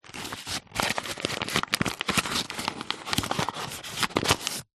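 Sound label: food flour bag paper handling; flour bad paper handling; zapsplat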